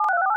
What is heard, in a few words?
DTMF profanity